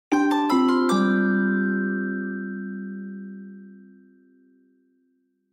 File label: Chime